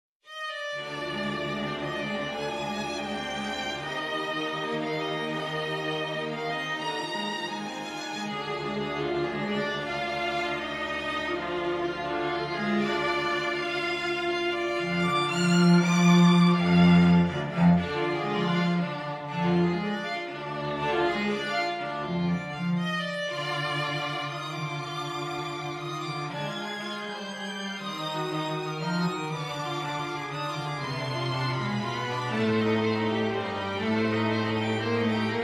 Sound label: string Vignette